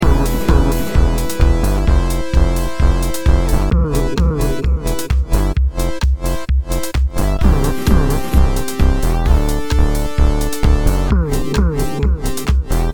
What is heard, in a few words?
Subbass Chain